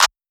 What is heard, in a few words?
CLAP